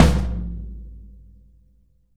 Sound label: tom, drum